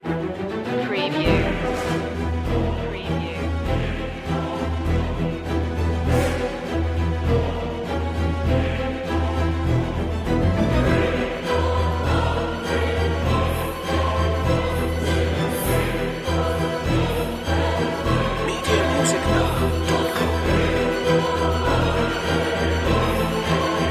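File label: no percussion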